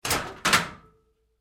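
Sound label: Door Locker Open Close Up Light, Metal Door; Metal Doors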